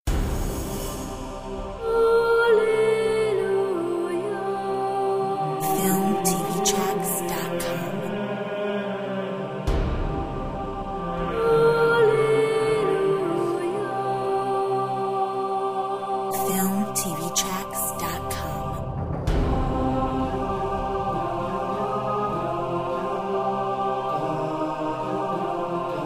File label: Gregorian